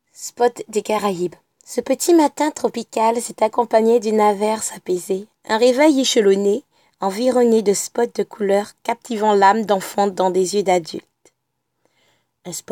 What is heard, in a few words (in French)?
vocal